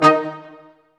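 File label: Large Ensemble, Brass Staccatos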